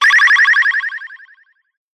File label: cartoon vintage ping vibrate hit high pitched